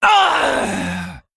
Female Worgen Death

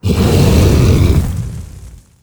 Core Rager Enter Play

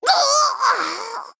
Male Death

